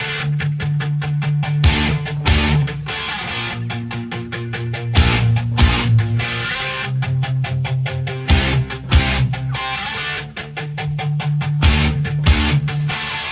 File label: guitar, Jazzmatazz Loops